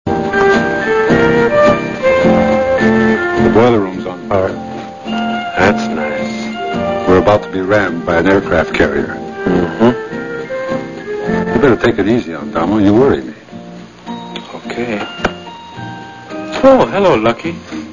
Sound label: fire